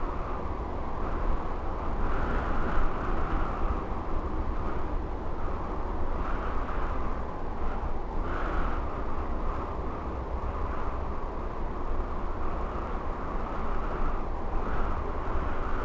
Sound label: Tatooine wind, ambience; Star Wars Obi Wan; XBOX